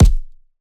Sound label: Punching Perc, Straight Glove; 99Sounds; Punching Percussion